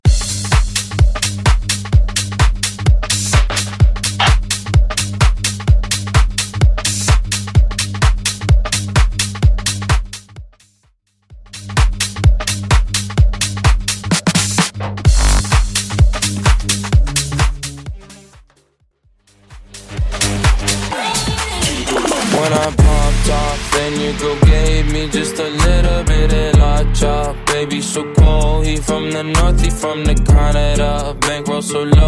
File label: Bass House Club Mix 128 Bpm